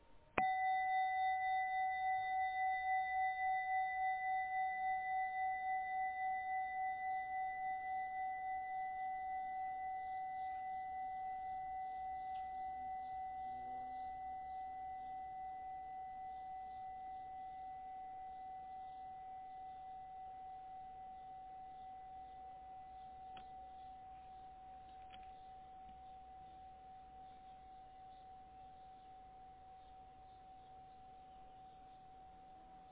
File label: bronze bowl, singing bowls